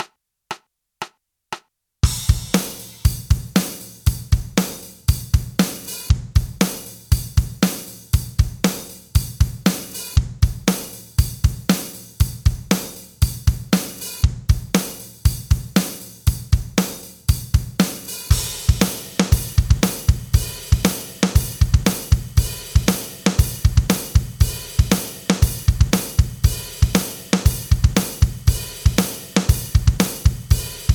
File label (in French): Batterie